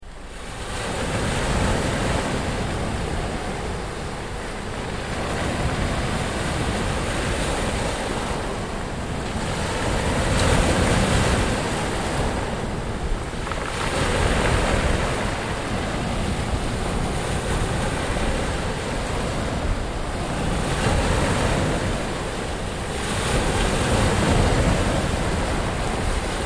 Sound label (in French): Bruitage Mer